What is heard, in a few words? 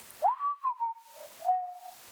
ambience room butchery whistle